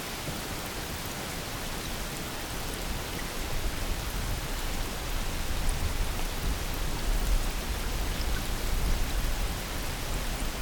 rain

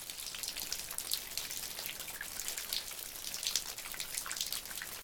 waterfall; OneShot Fading Memory

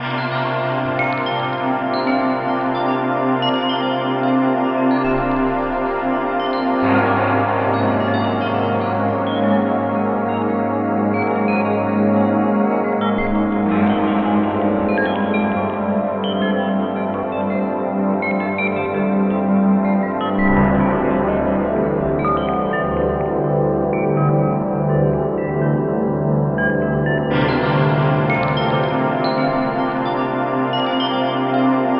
computer room; OneShot Fading Memory

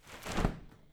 umbrella open; OneShot Fading Memory

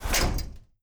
robot stop; OneShot Fading Memory

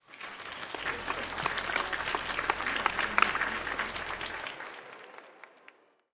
crowd lite

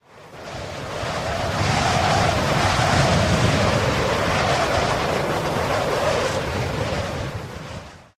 high altitude; OneShot Fading Memory

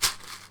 step paper; OneShot Fading Memory

